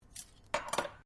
cutlery general place bench, Household cutlery; Kitchen

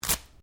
Clothing Velcro Rip Short; Movemement sounds for various articles of clothing; Ripping Sound Effects

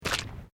Footsteps Boots Asphelt Puddles Step; Footstep Foley; Human

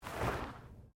Body movement Slide; Body movement foley to simulate falls and deaths; Body Sound Effects; Bodies (Sound Effects:Human)

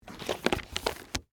Bible Open Cover, Book, On, Table; Foley; Books; Offices; BlastWave

